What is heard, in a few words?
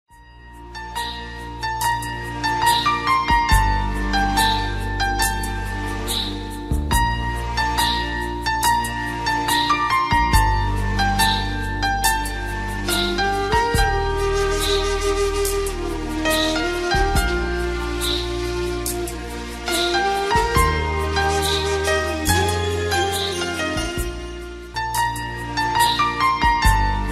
Instrumental